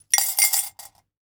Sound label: real coins pickup